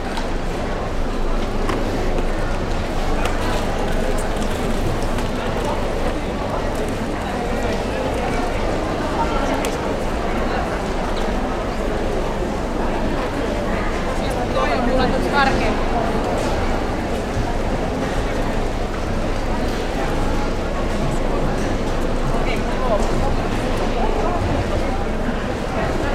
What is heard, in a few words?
Growd Noises